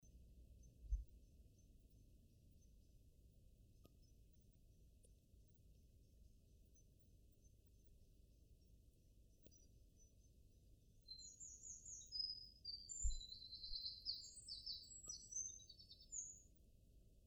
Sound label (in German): Lichtung Soundaufnahme Botanischer Garten Marburg